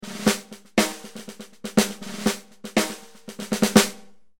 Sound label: yamaha brass